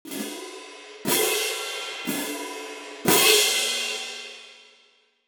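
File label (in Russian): Tarelki parnye, pair cymbals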